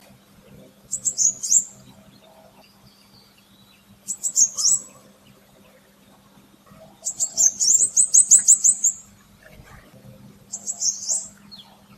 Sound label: Crimson Sunbird calls